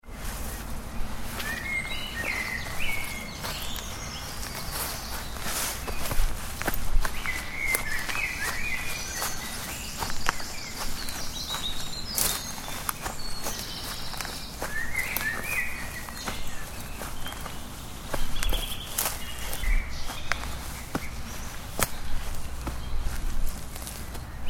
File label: Walking Through Woodland, Footsteps walking through woodland with bird sounds; Human